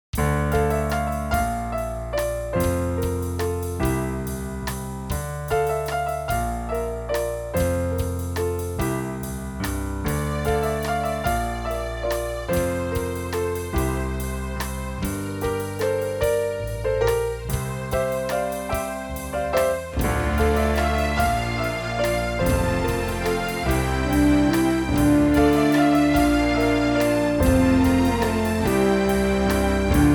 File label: big waltz